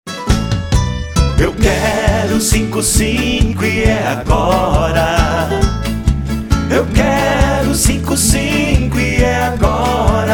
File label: Jingle Chamame